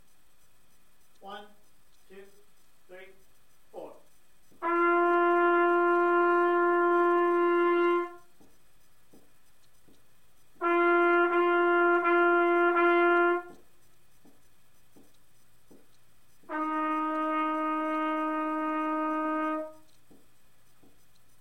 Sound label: tpt tongues